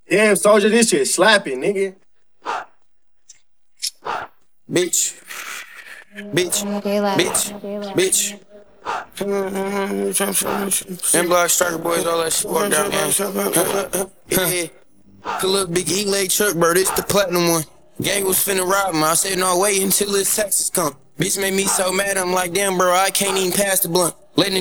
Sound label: vocals